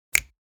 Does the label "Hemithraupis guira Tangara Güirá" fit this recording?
No